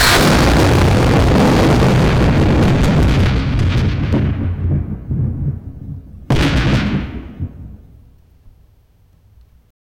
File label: a quick lightning attack, electricity